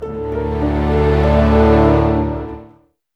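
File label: orchestra doom; East West; Scoring Tools; Doom's Day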